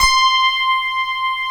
FM ELP C5 L, Keyboards Old School; USB Soundscan vol.09; AKAI; FM ELP 3

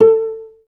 CLS PIZ A3, Advanced Orchestra; Best Service; Peter Siedlaczek's Advanced Orchestra

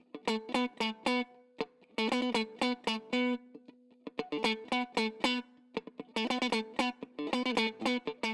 Pickin Guitar, E 115bpm; Zero-G; Nu Disco; Construction Kits

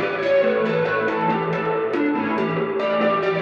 Cigar Flange FX 140 A, guitar drone

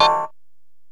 EPerc, Alesis DM-PRO; 300 Drum Machines

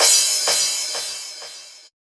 Crash Wet, 128BPM; Drum Loop; One Shots; VEC5 Multi Loops

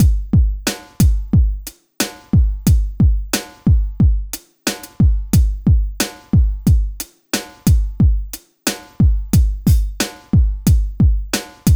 Beat, Hip-Hop

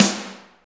SNR, Tekno Industrial; East West

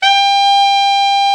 TENOR, Tenor Sax; Tenor Sax 1

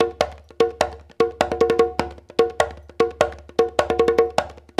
100 TAP, African Ethnic Drums; Spectrasonics; Supreme Beats

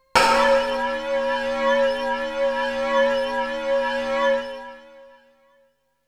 Melody oneshot; ZERO G - Datafile DISC 2

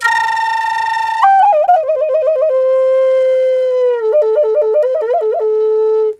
A WILD, FLUTE WILD

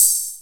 Tiny Hat; Digital Sound Factory Xtreme Lead

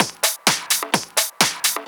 Discohouse Loop 128 BPM

